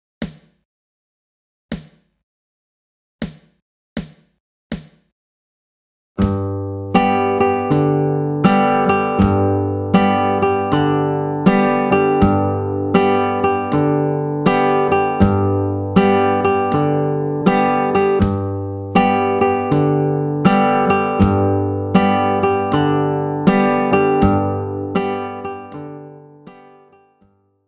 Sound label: carter picking langsam